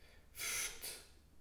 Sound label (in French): soupir; Index des soupirs; Hadjithomas Joreige